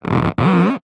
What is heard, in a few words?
office whiteboard rub fingers 2 squeak